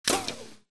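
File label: bullet light ballistic titan ricochet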